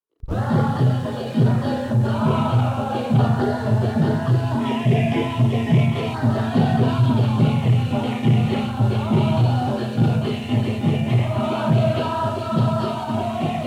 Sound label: singing kirtana; Srila Gurudeva